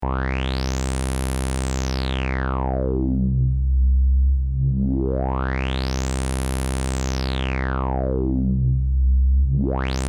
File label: LFO Audiorate Modulation